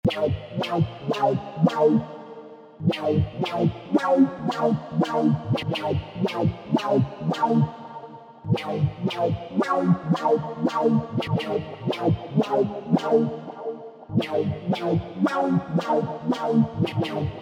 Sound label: Heart Throb